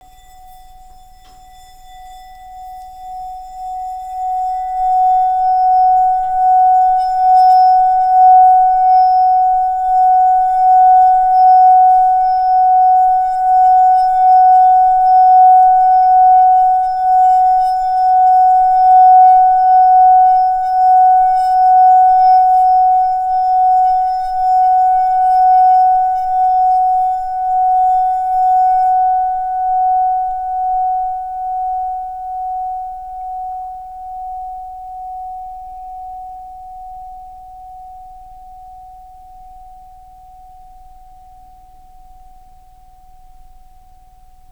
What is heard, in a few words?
Bowl Edge